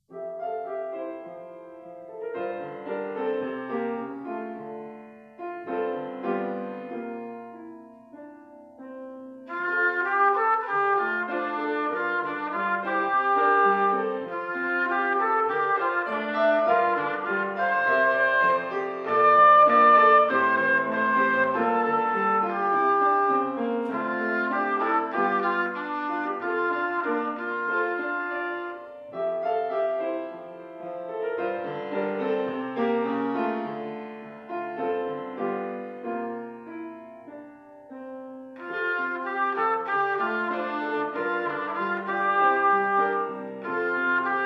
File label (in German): Trompete